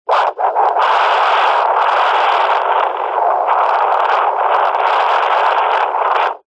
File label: technology cb radio wind blowing receiver speaker pov